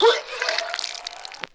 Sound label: vomit